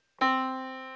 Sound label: yamaha Disk